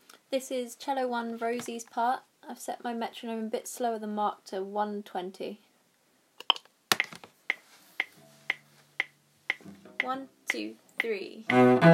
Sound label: Cello 1 part